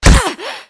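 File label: bullet hit flesh female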